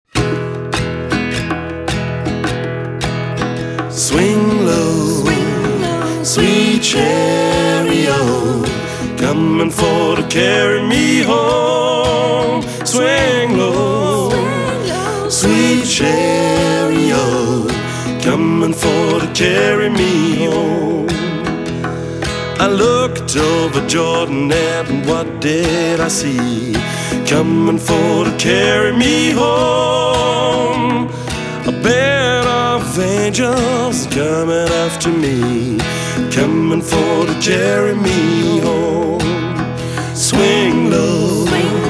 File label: swing low